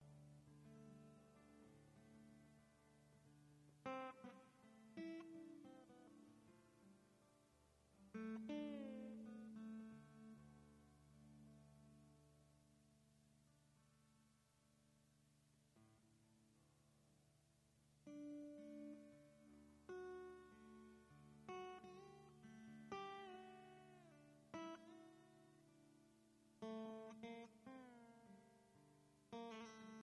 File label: Improv Jam